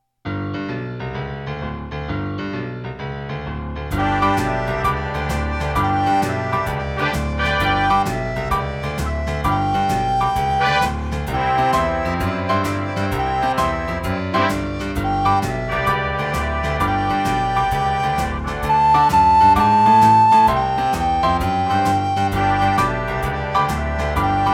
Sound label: ged blues